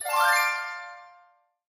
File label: text effect; Sheriff Of Nottingham